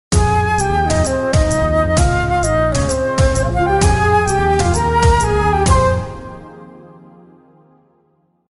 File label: flute; geo tv